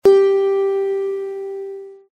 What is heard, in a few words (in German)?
Zithermacher Ton 8 LAUTER